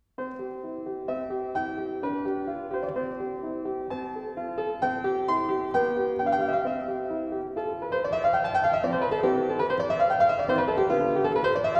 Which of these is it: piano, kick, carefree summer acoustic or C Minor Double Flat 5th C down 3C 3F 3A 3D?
piano